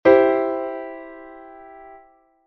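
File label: C△ chord first inversion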